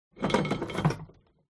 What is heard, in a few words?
MEDIEVAL Drawbridge Pull Up Short; OBJECT; smartsound